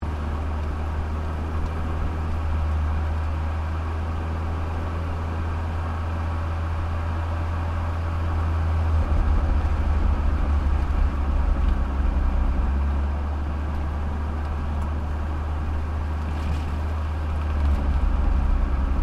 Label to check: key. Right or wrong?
Wrong